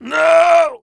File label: YELL, MALE HUMAN; Spectrasonics Vocal Planet